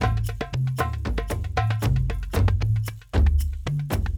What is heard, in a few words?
Kendong